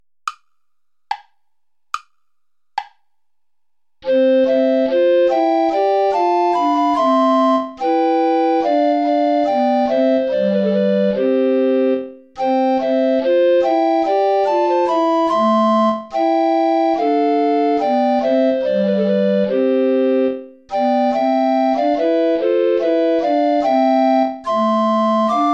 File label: play along without tenor recorder; praetorius michael nach gra ner farb mein herz verlangt